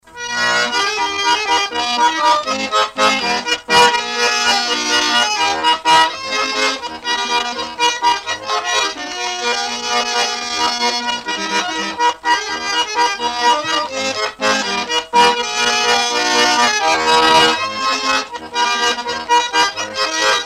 Loosu valss lõõts; Polva; folk

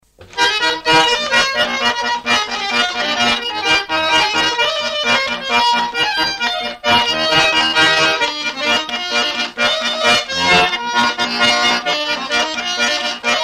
polka, loots, folk; Polva; Noore-ea polka

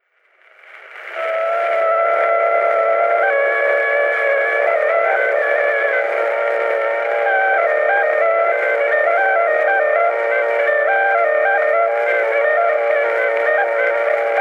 Torupilli Jussi lugu, Emmaste, torupill, folk

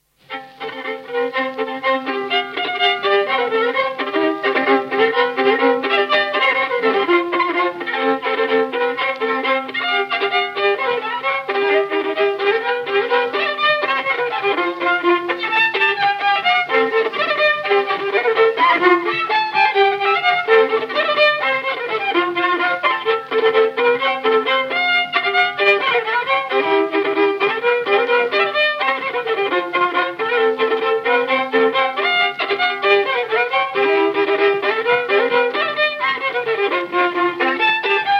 Nummerpolka Paistu viiul folk

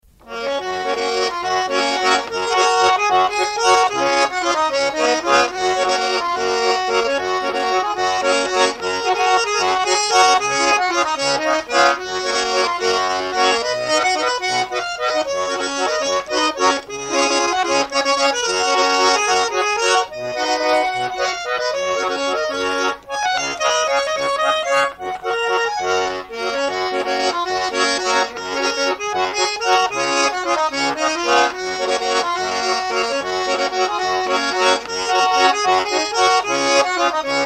valss, loots, folk; Aleksandri valss; Polva

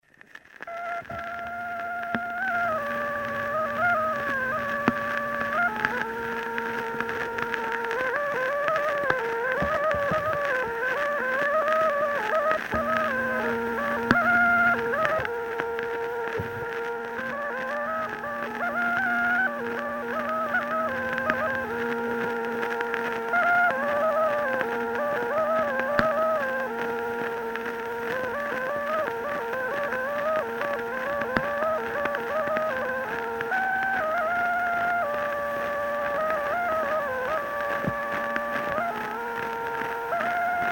Saajalugu, Kuusalu, torupill, folk

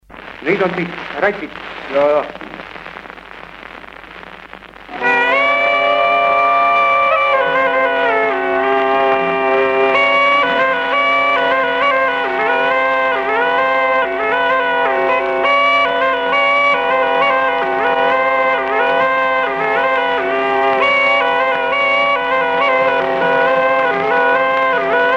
Raditants Kuusalu torupill folk

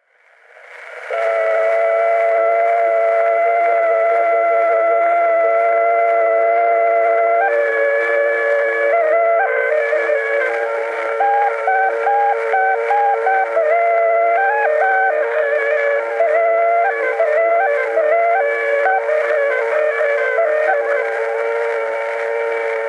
Torupilli Jussi lugu, Emmaste, torupill, folk

